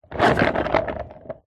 industrial dolly trolley wood small on wheels push roll on wood; zapsplat